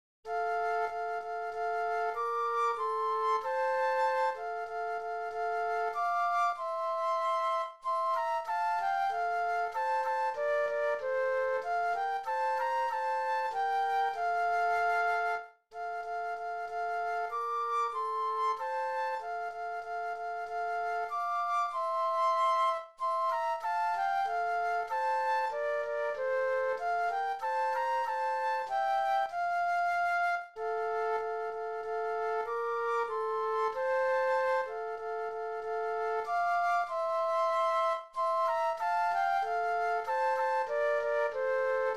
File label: Flute Duet; christmas